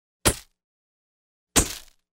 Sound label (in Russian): zvuk camera